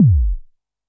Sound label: korg trident